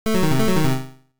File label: Systemsfx unused